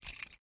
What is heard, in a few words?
jingle, metal keyfob; physics; props